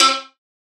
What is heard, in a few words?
Noises Strings; Synthetic's Serum Collection; Production Master - Vital Future Bass 4